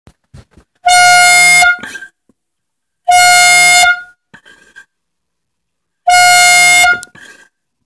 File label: horn